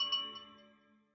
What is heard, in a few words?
Amethyst resonate